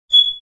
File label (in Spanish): detector humo